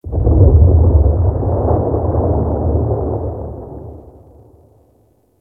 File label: Distant Ice Break Large; Warframe; Corpus Ice Planet